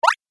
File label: Game App Throw, Video Game Sound Effects